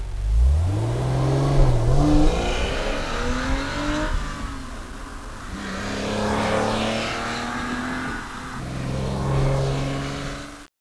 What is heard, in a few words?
Porsche 3 6; 914s; washington state 914s